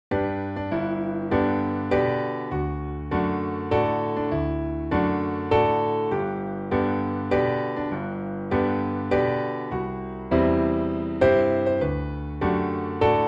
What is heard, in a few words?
Happy Birthday G Major piano